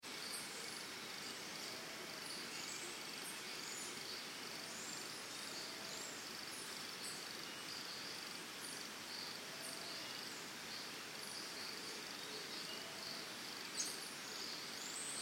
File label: Birds Crickets Costa Rica Early Morning; Backgrounds; Forests and Jungles; Big Room Sound